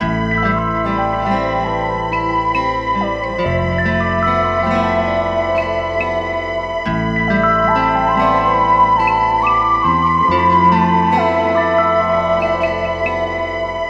keys

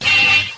screeched

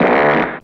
fart short

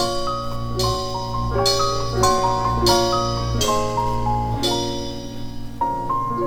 piano, Sad

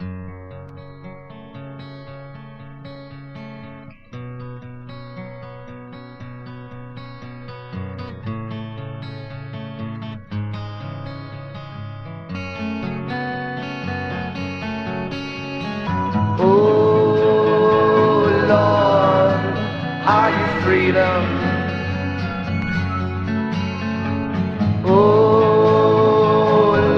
guitar, Jazzmatazz Loops

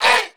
"oh" group

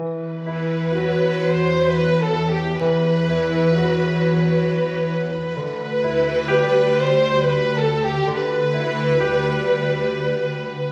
strings worry; Sad; Loops

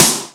Snares, Real

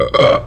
burp short

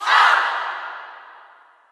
"ah"

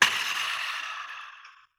"ah", nongender whisper; Voice; ooh ahh

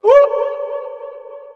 "ooh" male; ooh ahh

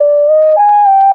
warning sounds; Loops; Electronic

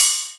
Hats Electro Open

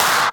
Clap n Snap, Snares

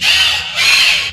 that's right crowd

